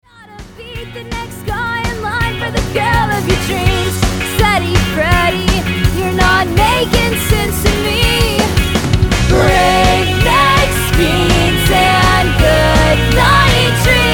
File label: Rock